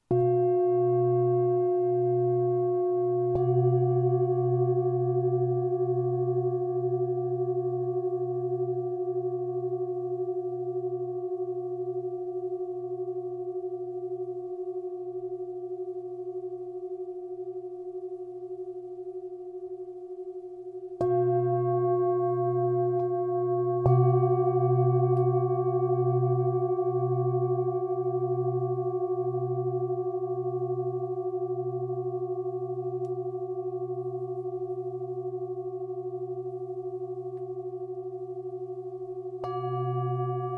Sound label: Bowls